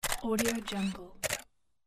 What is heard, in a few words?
camera shutter